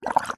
drink bubbles blow glass water surface through straw short burst; food